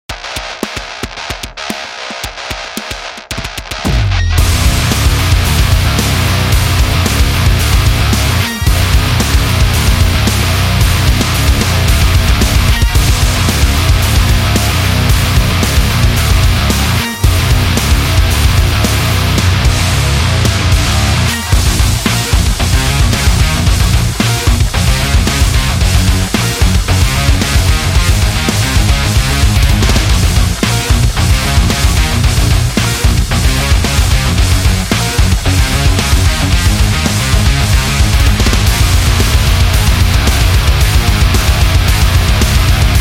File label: This Metal